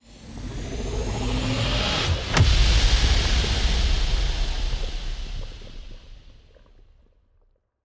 toxic planet hit; weapons; Stellaris; toxoids

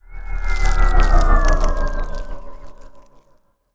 ship construction move; ships; biogenesis

